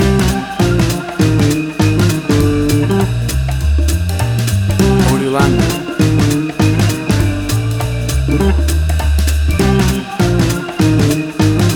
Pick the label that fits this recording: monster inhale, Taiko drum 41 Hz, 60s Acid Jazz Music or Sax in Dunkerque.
60s Acid Jazz Music